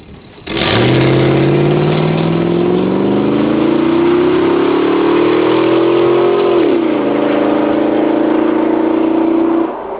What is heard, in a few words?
launch; Corsa